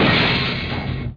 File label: door slam